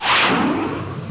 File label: rocket fire; weapon; missile